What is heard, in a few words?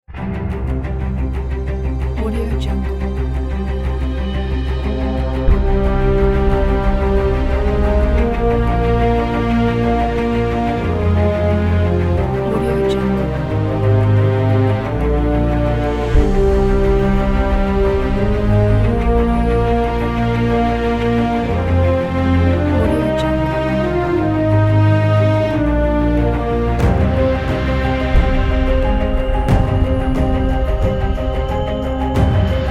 epic intro